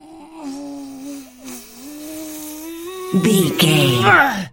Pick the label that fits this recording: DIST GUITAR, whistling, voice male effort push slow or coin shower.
voice male effort push slow